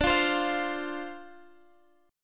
D-Minor 7th D Guitar Standard, chord; Dm7; x,17,15,17,18,x; strummed; capo=2

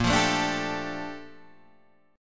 A sharp Major 13th, Guitar Open G

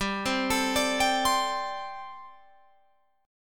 G Suspended 2nd Suspended 4th G, Guitar, Open F; arpeggiated; 19,19,21,21,22,19

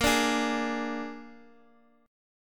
G sharp Suspended 2nd Flat 5th, guitar; x,x,x,3,3,4

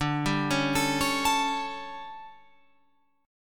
D Major 7th Suspended 4th, Guitar, Open F; DM7sus4; arpeggiated; 14,14,13,16,16,16

